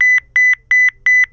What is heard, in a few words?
Analog Beep